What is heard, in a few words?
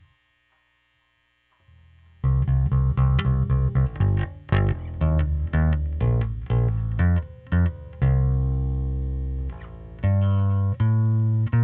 Bass OD